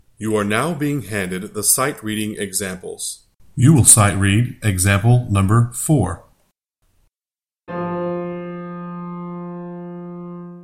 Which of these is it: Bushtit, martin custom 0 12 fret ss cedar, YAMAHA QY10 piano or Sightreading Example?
Sightreading Example